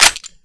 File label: rifle mag in